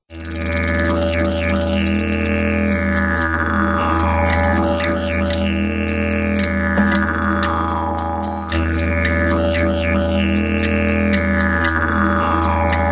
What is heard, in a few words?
Didjeridu; Festival di Idioma – Aña 5